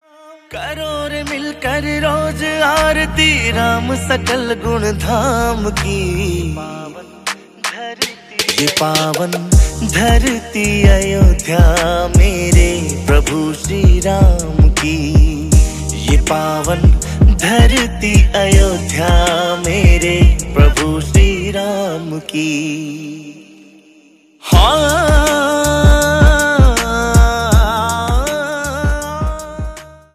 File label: Ram Bhajan